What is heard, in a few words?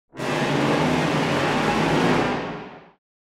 Cartoon failure brass